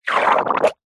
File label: liquid foamy frothy squirt bubbles shallow